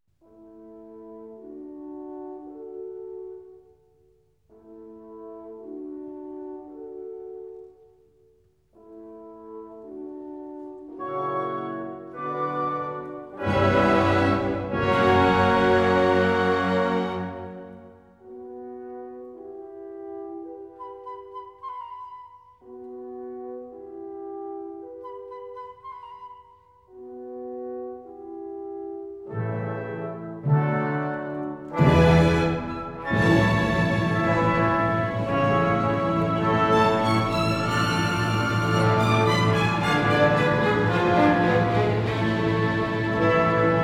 Violin Concerto in B minor, Adagio; Paganini; ＂La campanella＂

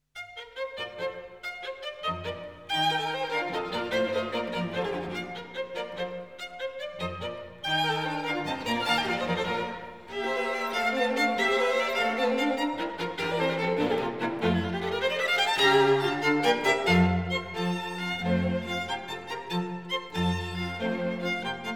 Divertimento in B flat major, Allegro assai; Mozart

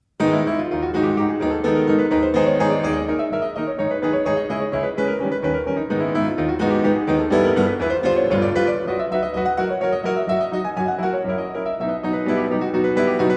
Preludes for piano, No. 12 in G sharp minor; Chopin Waltzes 4 Scherzos 26 Preludes

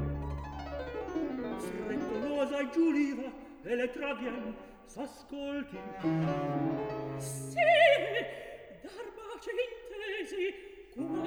opera, Recitativo

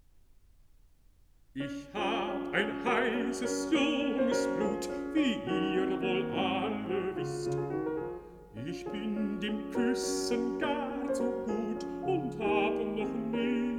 song for voice & piano

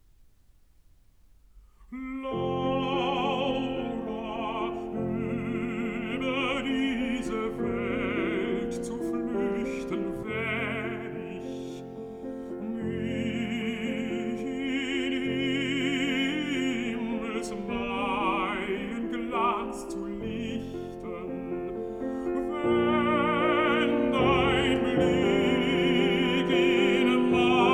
song for voice & piano

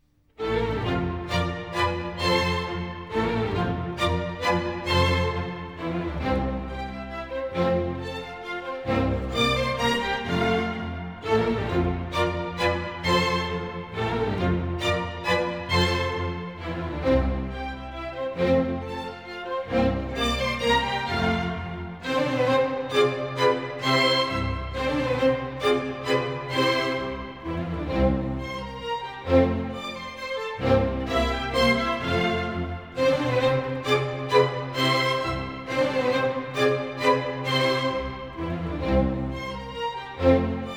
German Dances for string quartet

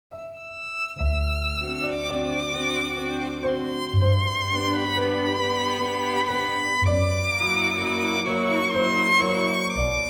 for voice & piano; Si vous l'aviez compris (L'avessi tu compresso); Malinconia d Amore

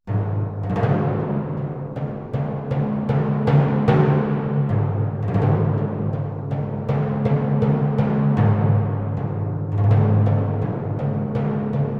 Timbales; Marche de Timbales; Bass Power

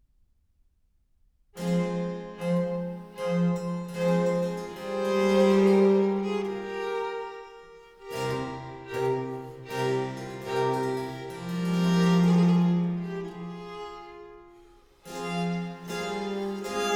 Sonata in A major, Larghetto, Trio Sonatas; Handel

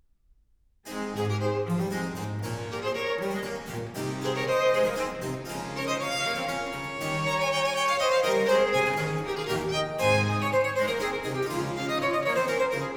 Sonata in A major, Allegro- Adagio, Trio Sonatas; Handel

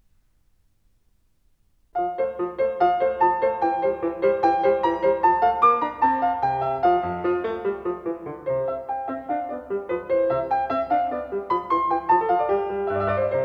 Sonata for violin & cello in F major, Allegro; Mozart

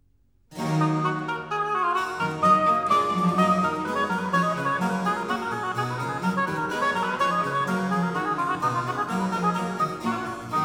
Sonata for oboe & continuo in E minor; Essercizii Musici No. 11/21; Vivace; Vivaldi, Telemann; Oboe Sonatas

